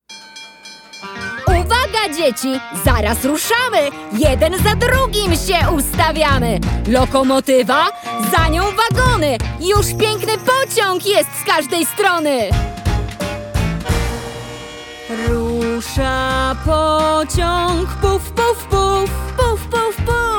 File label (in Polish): pios pociag; multiprzewodnik okladka pociag